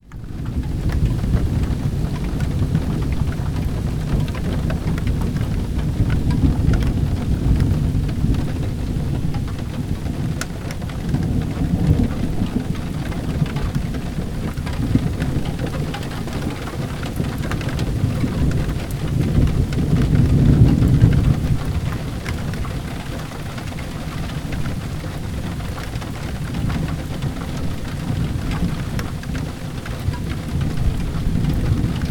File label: Storm Orage